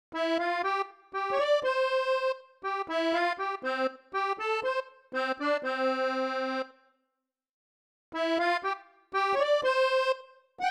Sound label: melody; game builder garage 2021 switch